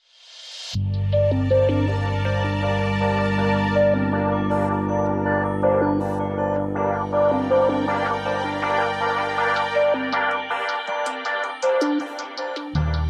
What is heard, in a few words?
Chill No Drums